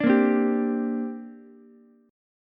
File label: C 7th Suspended 2nd C down Ukulele; C7sus2 strummed